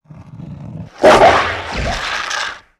Super Jets Overhead, Sound Design - Sci-Fi; Sony Pictures Sound Effects Series Volumes 1-10; Sony Creative Software

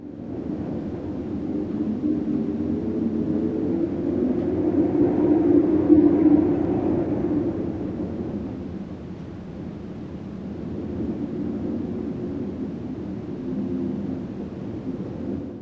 wind; RYL 1 v5.57

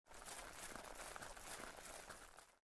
Footsteps; Age of Empires III; cinematics

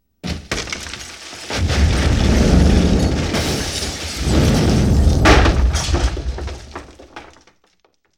Explosion; Sony Pictures Sound Effects Series; Explosions - 5.1 Surround

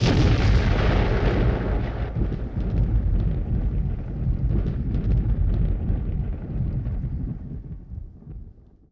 WWII Explosion With Low Rumble, Period Combat; Sony Pictures Sound Effects Series

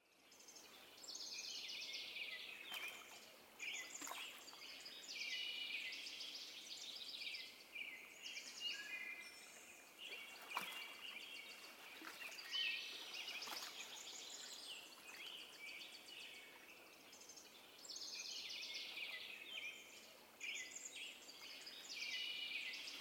Ambience

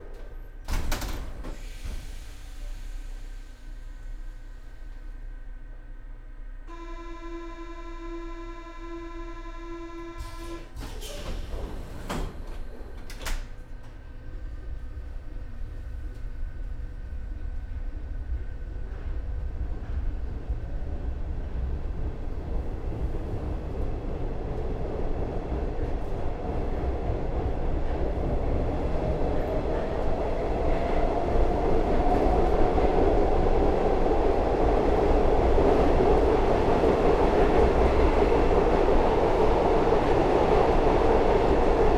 Subway Ride; Sony Pictures Sound Effects Series; Vehicles; Trains

